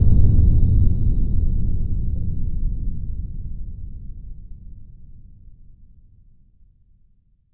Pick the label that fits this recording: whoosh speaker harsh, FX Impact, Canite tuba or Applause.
FX Impact